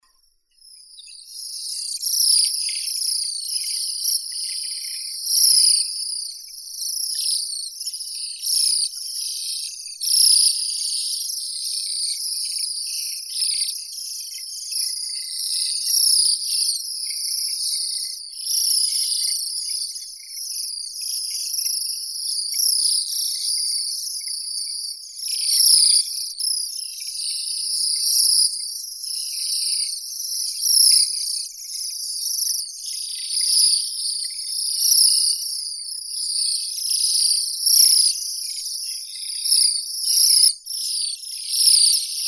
sviristel obiknovennii bombycilla garrulus, zvuki prirodi

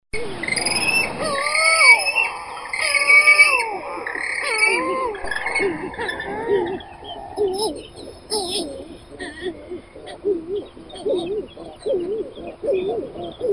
burevestnik serii puffinus griseus

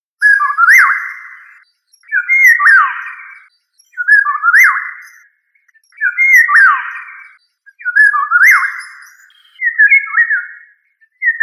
ivolga obiknovennaya oriolus oriolus

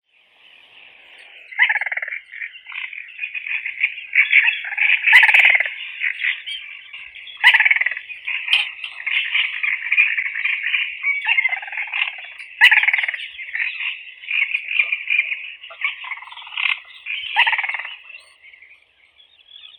pogonish malii porzana parva; zvuki prirodi

